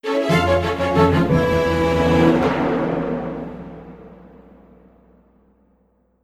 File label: Lose; Mini Carnival; Android, iOS